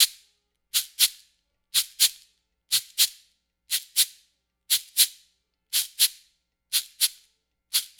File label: Cabasa, 120